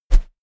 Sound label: medieval notification popup